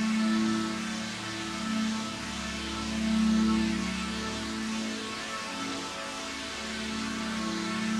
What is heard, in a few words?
Drone; stereo toolkit samples; Non Tempo Loops